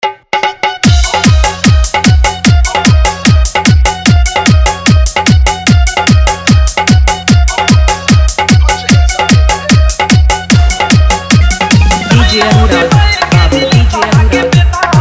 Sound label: Full Dhollki Bass Rod Dance Mix